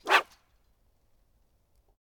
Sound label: Fishing Rod; Fishing2; zarzut